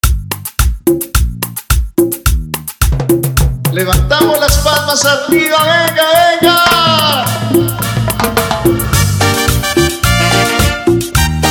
TECHNO CUMBIAS

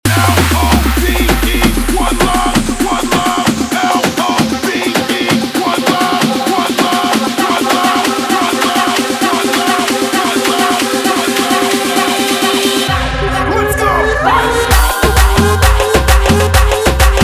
guaracha remix 131 bpm

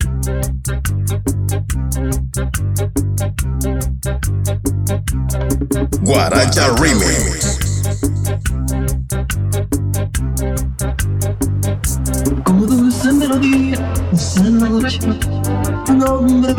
133 BPM

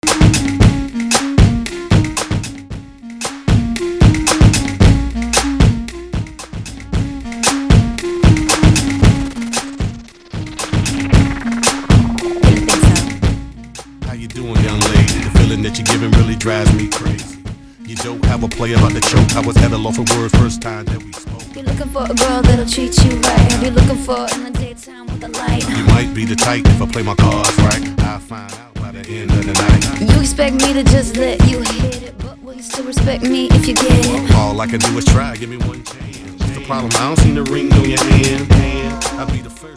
intro acapella 121 bpm; remixer from Nicaragua; dance challenge

